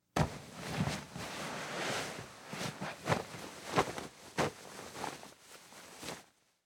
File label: Household Blanket Grab And Pull